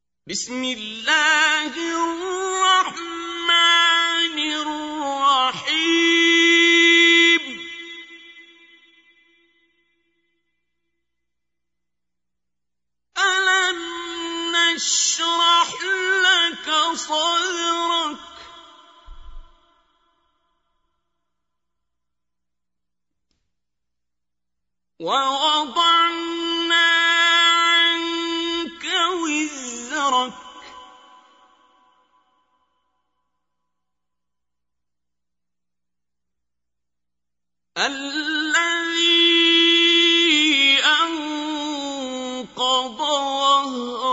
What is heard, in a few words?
Recite, qari quran; Surah Ash Sharh; Qari Abdul Basit